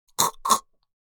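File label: Adult female choking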